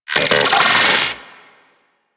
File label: Vocal Pain; Warframe; Corpus; Arachnoid Wraith